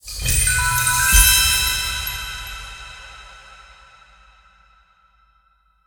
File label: medium Win lighter; wilderland